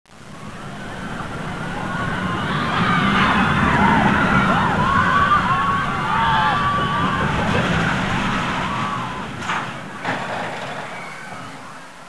coaster

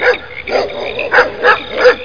bump

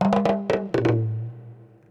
PERC LOOP ETHNO 125; eJay

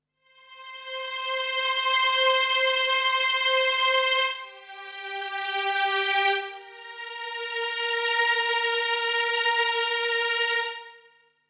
STRING D+B 160 C; eJay; Drum+Bass

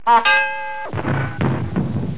BANG BELL, Cartoon; 2000 Sound Effects

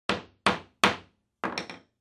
HAMMER

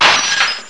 GLASS; Atari Mega Archive - Volume 1; music

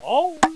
lose

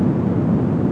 tarmac; RedLine demo

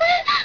gasp; Rayearth

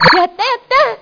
GOAL

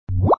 bounce; armadilloknight2; Fight

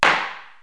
Gavel (Single Hit); KABOOM! - Wild and Wacky Sound Software; City Life; Law & Order

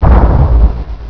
FAE Explosion; Triple-A 1.6

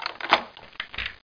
UNLOCK

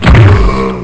splat; Carmageddon Demo 1.0

